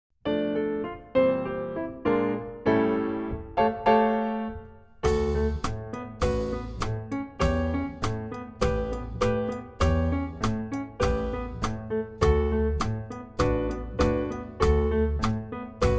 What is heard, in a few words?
Cha cha cha